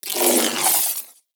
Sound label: Ball Held Spark; Warframe; Missions; Tenno Ball